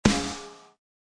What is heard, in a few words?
Alt Rock Snare, DrumKit; REAL LIVE KITS; Osama Qureshi DrumKit